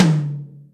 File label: Room, Acoustic Tom; Yamaha DTXpress